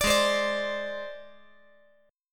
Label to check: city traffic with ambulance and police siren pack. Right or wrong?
Wrong